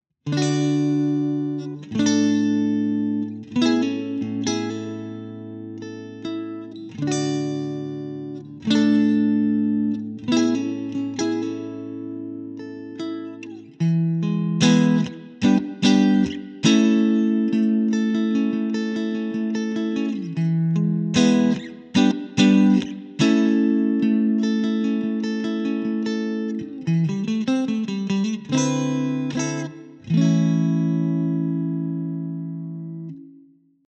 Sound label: clean; jensentone